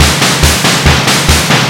Industrial Beat

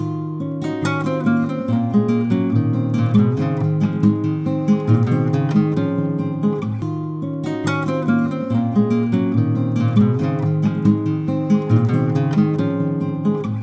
Nylon Guitar

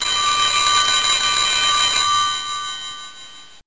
Phone Ring Sound

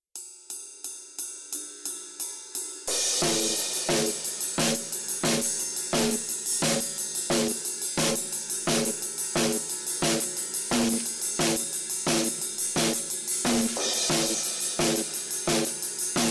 Minimal Rock Drums

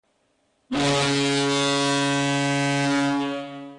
Hockey Horn

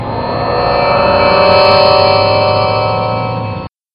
Beam Up Sound

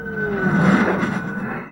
Alien Fly By

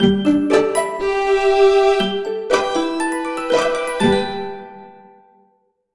Orchestral Melody

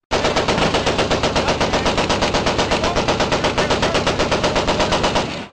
Machine Gun Sounds

